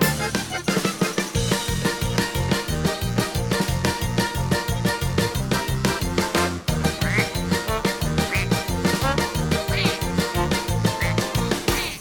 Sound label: music skillshot; My Little Pony